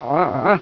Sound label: GRRR, dogz